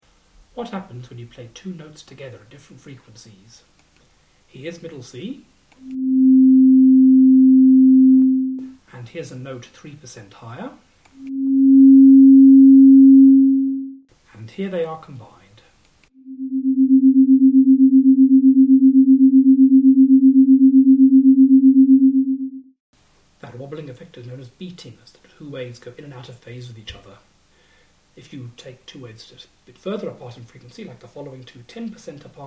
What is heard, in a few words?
two notes frequency; Two Notes: Frequency differences